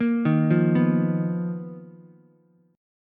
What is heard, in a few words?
B Major 7th Flat 5th B down Ukulele; BM7b5; arpeggiated; JGuitar